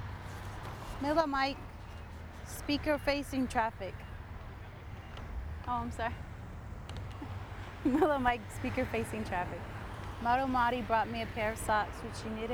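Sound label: Milli Mic 0ft Speaker Facing Traffic, Condensor, Exterior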